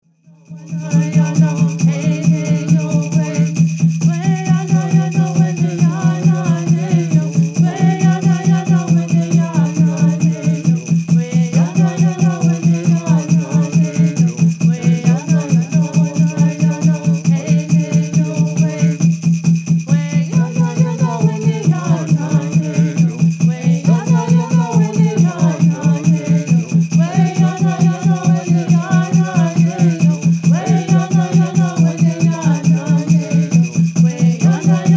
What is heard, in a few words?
Straight Song